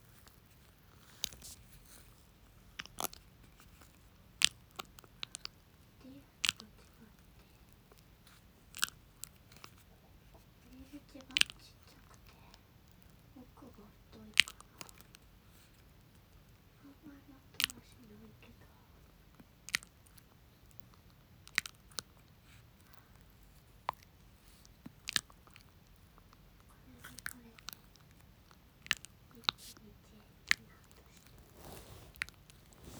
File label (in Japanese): 吸う やつ くちゅくちゅ